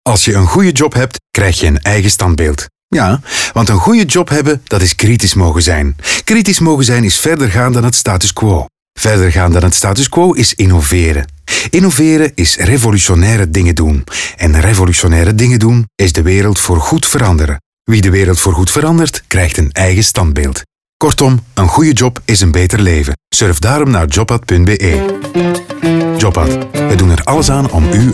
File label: Jingle; Jobat; Standbeeld